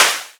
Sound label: Claps; Vengeance Samples